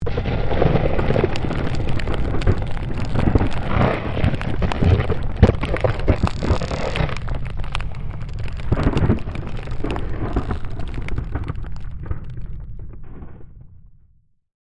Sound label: Created Magic Spell Glacier Quake; Series of created spell sounds